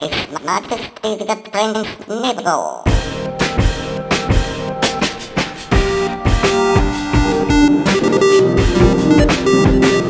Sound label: fanfare; prince nibbles; weeklybeats-2014